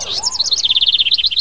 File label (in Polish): ptaszek, głosy ptaków